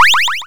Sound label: Check Point Reached